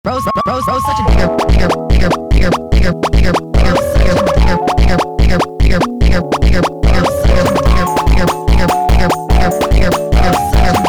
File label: funk sped up